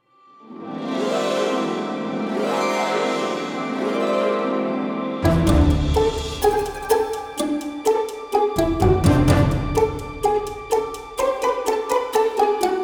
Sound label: Glissando Harp; Secret Royal Inspector Joy; Korea 2021 OST